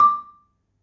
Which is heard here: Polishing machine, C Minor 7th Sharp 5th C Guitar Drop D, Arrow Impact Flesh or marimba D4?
marimba D4